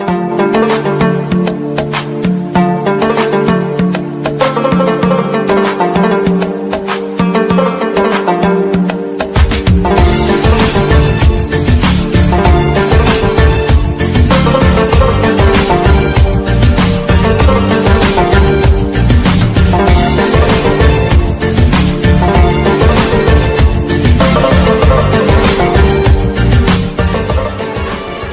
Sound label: Arabic Ringtone